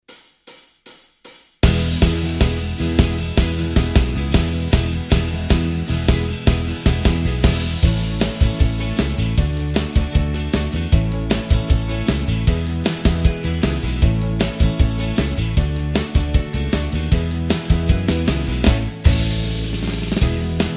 No Lead Guitar

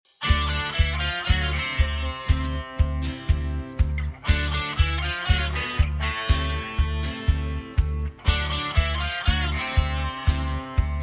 D To F No Backing Vocals, backing tracks

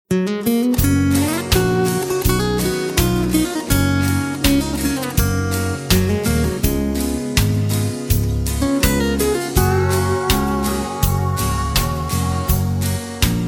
(Gb) No Backing Vocals

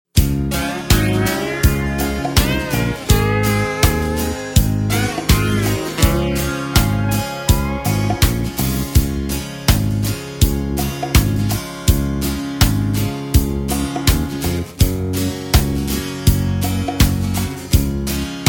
(Db) No Backing Vocals

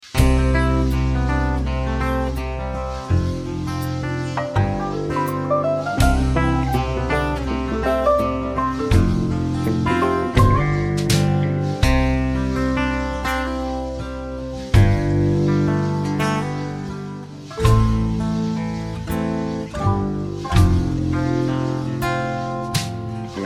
(C) No Backing Vocals

